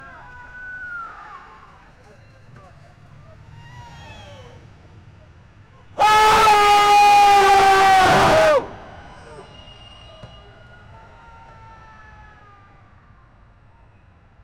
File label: asteroid hits nyc; disaster